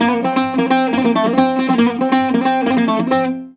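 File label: bouzouki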